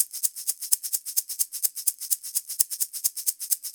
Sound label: Shaker Loop, 128 BPM; Vengeance Samples